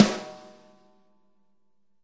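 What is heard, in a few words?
snare, Multisampled Real Kit; REAL LIVE KITS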